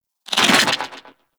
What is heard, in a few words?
Infested Rifle Charged 2D Tail; Quanta; Weapons